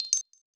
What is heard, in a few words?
Toggle Check; Sony Ericsson; J108 Cedar